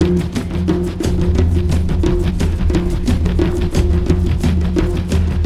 free games trigger; Fluffy Favourites Megaways

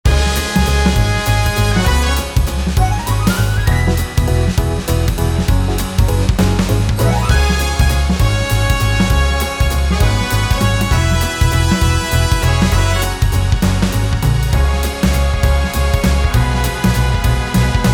games music; Fondant Favourites StarTrail; Eyecon

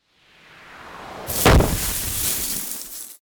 outro pinata sfx; without music

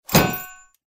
bonus hammer land; Highland Reels

